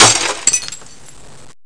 Glass Break

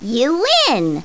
you win

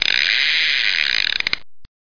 squeak; How Many Bugs in a Box

